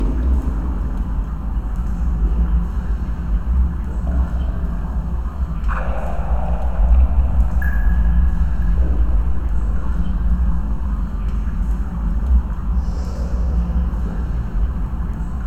Cave 3D loop; Town of Night

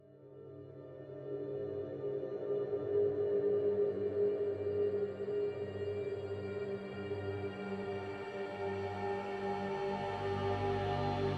Exploration

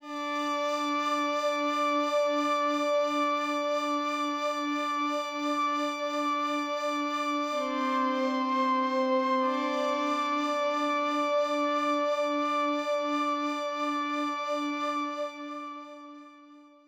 Pads; super crush k.o.

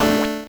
squish; earthnight level

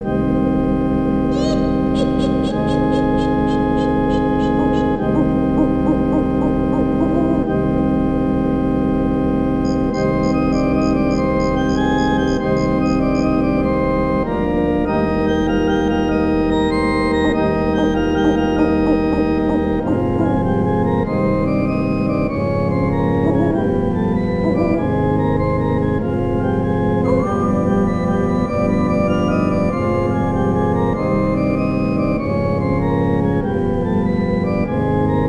Mansion with Ambience; Banjo-Kazooie; Gruntilda's Lair - Mad Monster Mansion

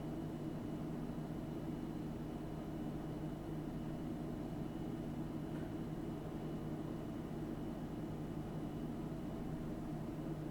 Amb Kitchen

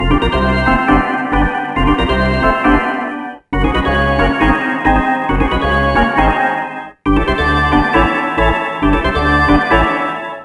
Ditty; NBA ShootOut 2003; ps1; ps2

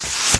Sfx Recycle Bin

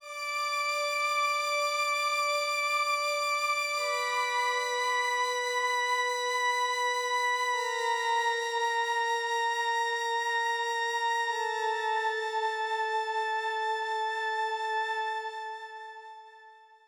Pads

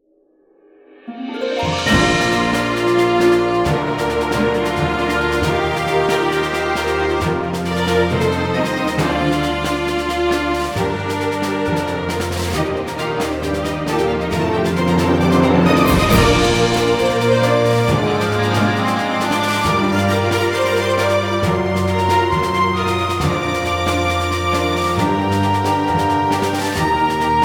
Start Menu

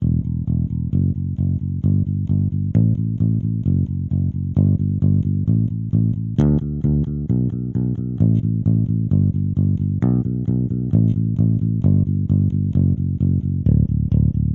Elec bass; super crush k.o.

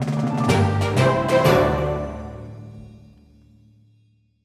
Runner Win

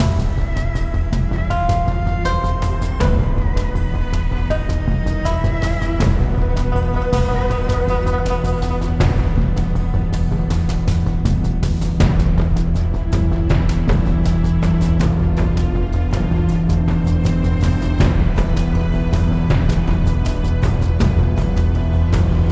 Battle; hidden dragon legend

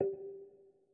Move G 3 Mp; Mixolumia

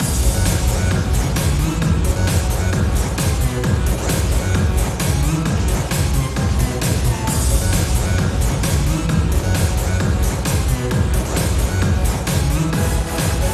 Nightclub

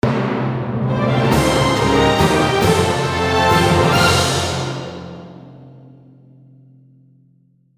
Jingle Victory; Inazuma Eleven Strikers 2012 Xtreme; Wii

